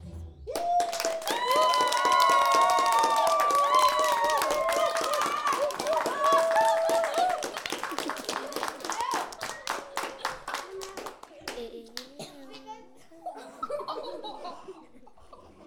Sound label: Kids Clapping; Plougras